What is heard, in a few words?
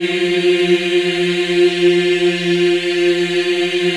F3, Choir Acoustic & Synth; USB Soundscan vol.28; AKAI